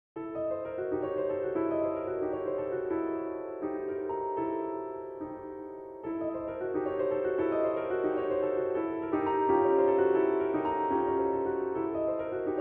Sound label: Solo Piano